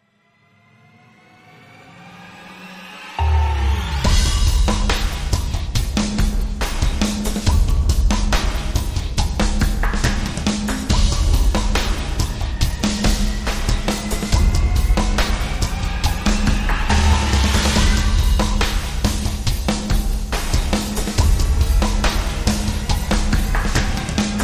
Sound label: End Drum Sting